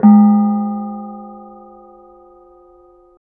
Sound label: KENONG; Spectrasonics; Heart of Asia; KENONG PH